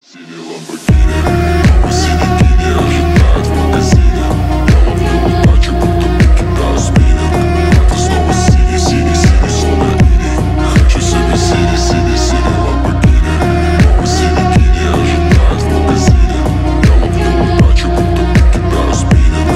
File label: arab remix